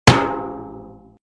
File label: Notification Table; Whatsapp